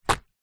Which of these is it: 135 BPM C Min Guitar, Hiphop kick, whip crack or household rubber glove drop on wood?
household rubber glove drop on wood